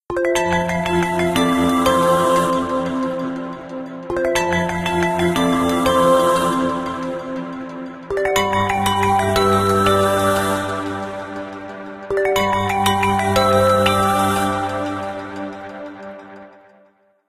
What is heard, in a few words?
Wind, ringtones; Honor; X5